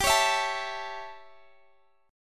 A Major G; strummed

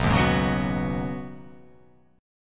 B 6th Add 9th B down, chord

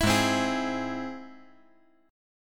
Ab Augmented Ab; Ab+ strummed; JGuitar

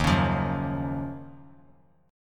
D sharp Minor 9th D sharp, down; D#m9 strummed; 3C,3F,3A,3D

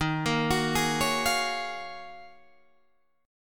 Eb Minor 11th Eb down Guitar Drop D; Ebm11 arpeggiated

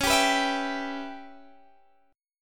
Db Minor 7th Flat 5th Db down Guitar DADGAD

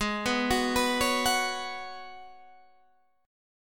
Ab Minor 11th, Ab; Abm11; arpeggiated

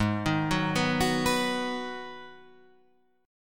Ab Minor 7th down Guitar Half Step Down

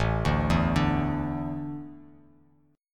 A Suspended 4th, Bass Standard; Asus4 arpeggiated; JGuitar

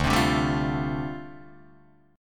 D Minor 11th D down Guitar Open D

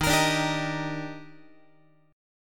D sharp Major 7th, Guitar; strummed; JGuitar

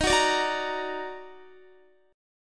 Eb Minor Major 7th Eb down Guitar, chord; EbmM7; strummed; JGuitar

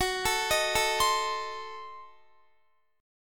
F sharp Diminished 7th F sharp Guitar; F#dim7 arpeggiated; x,21,19,20,21,19; 4E,4A,5D,5G,5C,6F; chord shape

